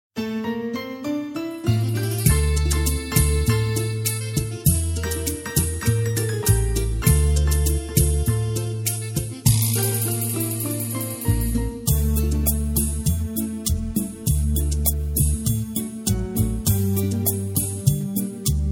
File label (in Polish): podaruj mi panie instr